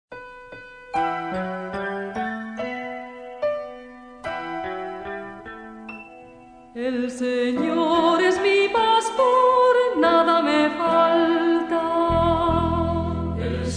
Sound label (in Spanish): Cuaresma, Canto de comunión; Cantos de Entrada y Comunion